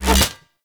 Fishing Spear Line Retract Catch; Weapons; Venus Fishing Spears; Warframe

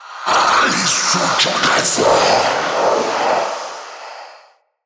Male Vocal Charge Scream, Enemy; Mockery; Mockeries

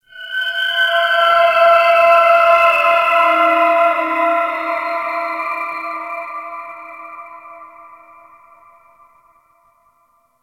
Space Exterior Dist Call, Ambience, Space Battles

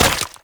Run Sludge, Footsteps; Warframe; Tenno

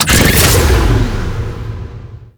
Nitro Start, Motorcycle; Warframe; Motorcycle Ninety Nine

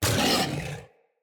Kubrow Feral Vocal Angry Attack Short

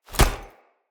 Kick Impact Flesh Armour Hollow; Envoy

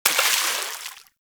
Bullet Impact Debris Water; Warframe; Weapons; Bullet Impacts

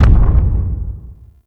Arachnoid Camper Distant Step; Warframe; Corpus

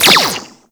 Sentinel Burst Laser Pistol Fire; Warframe

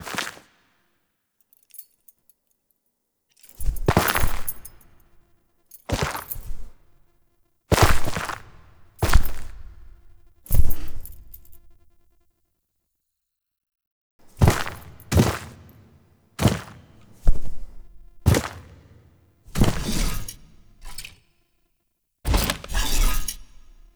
Throne Room Foley; Cinematics

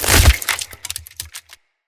Root Spike Impale; Powers; Bromius

